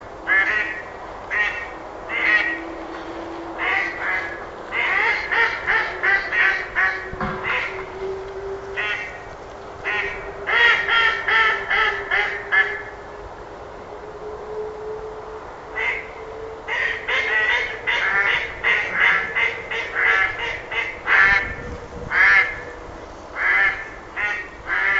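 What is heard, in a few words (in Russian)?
kryakva golos, mallard voice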